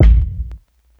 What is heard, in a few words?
Kick; Ableton Live 12 Lite; Core Library; Drums